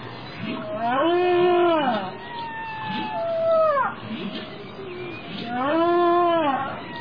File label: group of whales in the ocean